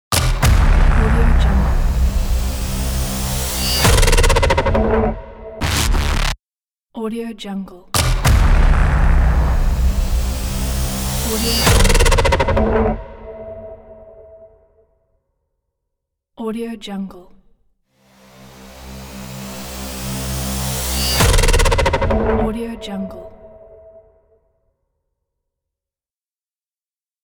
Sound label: glitch logo